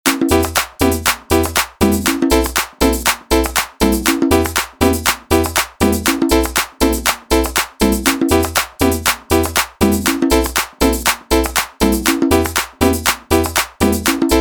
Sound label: Mambo